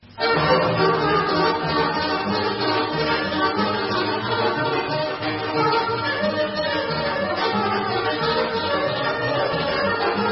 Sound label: Romanian Muntenia; Ciocarlanul